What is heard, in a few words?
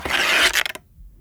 Arrow Spawn; Timelock VR